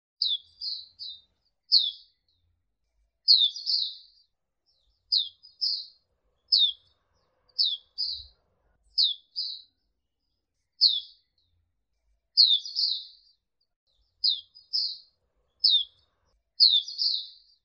suara pleci auri

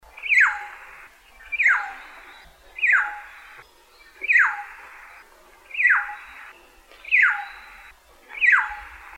kepodang timor

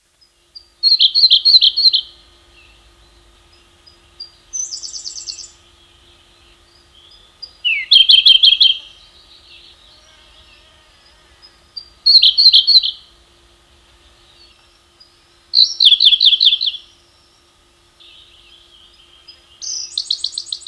suara berkecet siberia

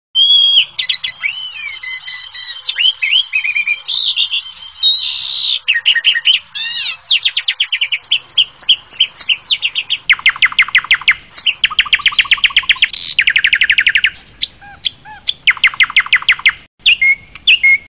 jalak suren master kenari

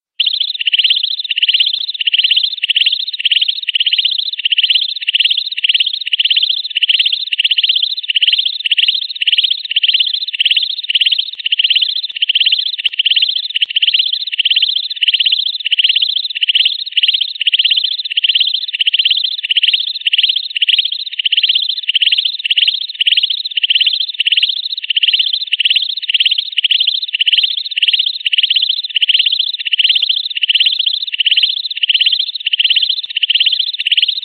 suara belalang daun

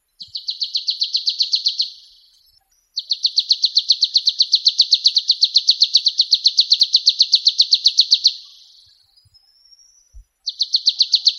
suara ciu kunyit

